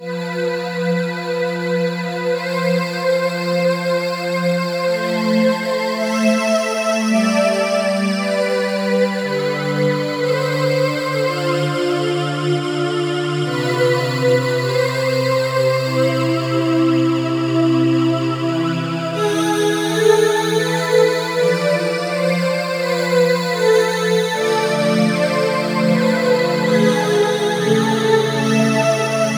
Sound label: Syn Vox, Roland JX-1; Factory presets; Additional factory patches